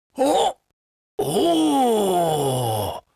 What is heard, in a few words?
red panda excited; netent